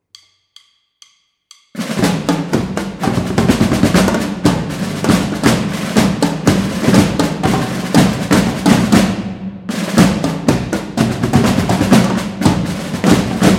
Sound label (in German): Tambouren Zugabe